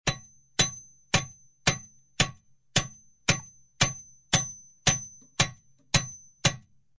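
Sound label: with a characteristic ring; Удары топора с призвуком; В лесу звук топора по дереву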